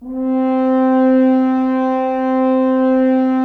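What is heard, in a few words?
Solo French Horn, Brass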